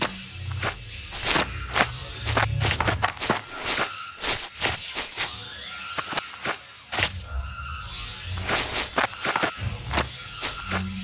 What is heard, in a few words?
emote dance; LoL; ahri skin04